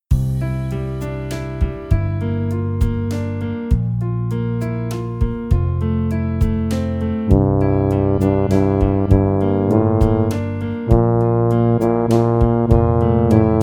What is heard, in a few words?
Tuba